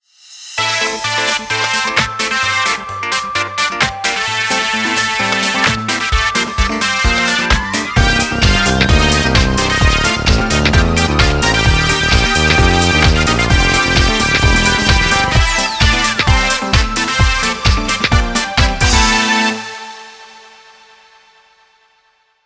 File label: Dance Floor, Nokia Tones; Windows Phone apps